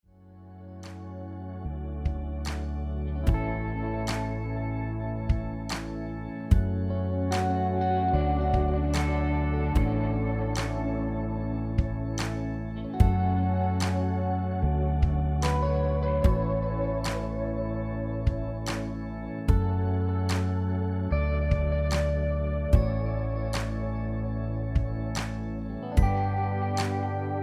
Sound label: rhythm